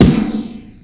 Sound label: sde a2, CASIO FZ-1 Special FX; ProSamples vol.55 - Retro Sampler